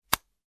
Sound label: Play Cards Deck Deal